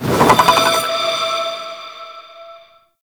Projectile Charge; Enemies; Abilities; Warframe; Sentient; Brachiolyst

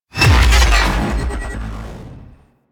Space Fighter Elite Laser Warp; Space Fighter Laser Agent

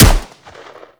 Grineer M16 Rifle Shot; Weapons

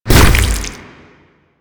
Infested Ancient Tentacle Swing Hit; Enemies; Warframe

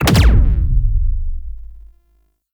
Concrete Archgun Charged Punch; Warframe; Archwing

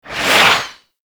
tank missile barrage whizzby; Enemies; Abilities; Lasrian Tank; Warframe

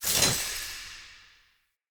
Casting Device Charge Heavy Start; Galene

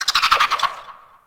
Venus Landscape Cave Creature Calls; Warframe; Venus Open World; Ambience

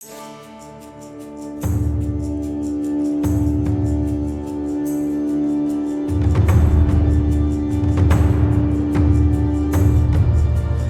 UI Menu Pick Puppy Music; Orengall

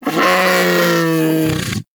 Vocal Death; Hunting Critters; Predasite

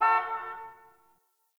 Distant Car Horn; Warframe; Ambience; Nineteen Ninety Nine

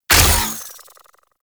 Derelict Prime Full Draw Shot; Infested; Cernos; Weapons

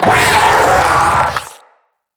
Spider Vocal Attack Short; Vocals; Root Spider Mother

